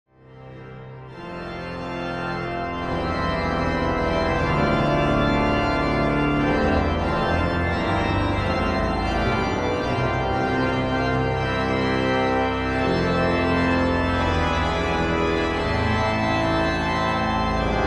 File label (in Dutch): Improvisatie; O Canada